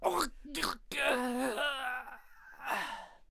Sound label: Ice Archer Death Vocals